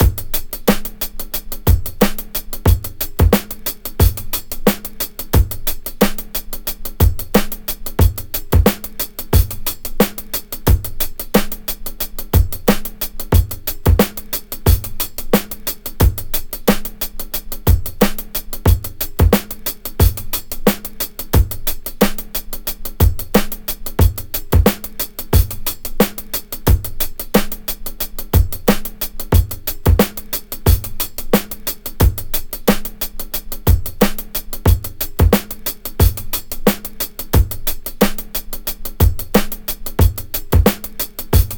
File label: 90 Heavy Groove, Breaks; Lockdown Breaks